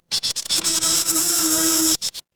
animal insect

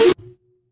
retro game power up

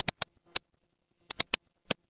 office mouse click

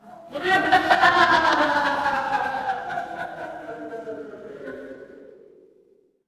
crowd laughter

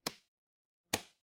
ui toggle

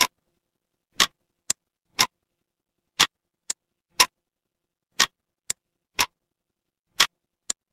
mechanical clock tick

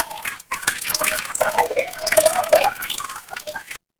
water pour

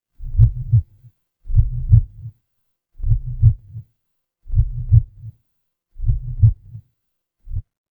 horror heartbeat

